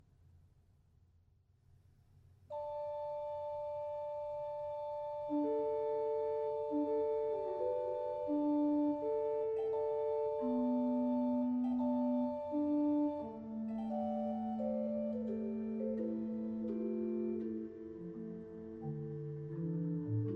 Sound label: Suite For Organ, Brass Quintet, & Percussion Prelude